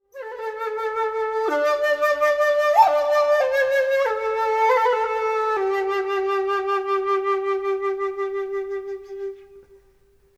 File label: aerophone